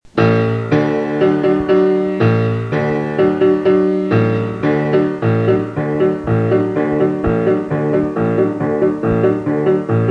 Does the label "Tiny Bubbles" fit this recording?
No